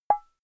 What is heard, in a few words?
glass short; signale